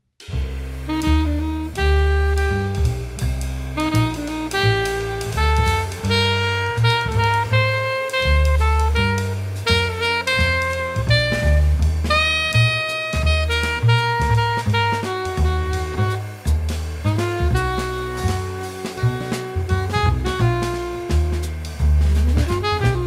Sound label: trio